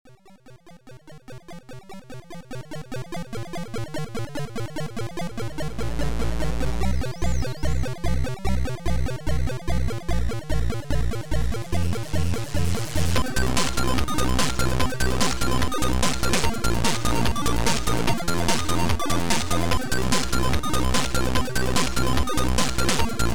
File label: Dance Trance